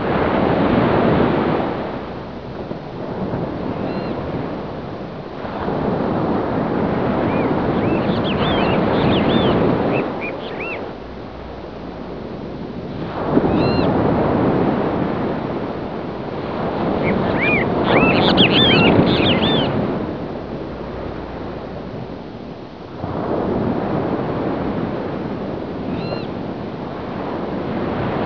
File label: air